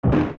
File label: Coffin Lid Fall; zelda; OOT